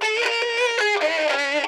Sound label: CHAINSAW, Funk Guitar; 90 s Sample CDs; Zero G